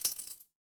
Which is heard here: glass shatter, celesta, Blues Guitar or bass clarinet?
glass shatter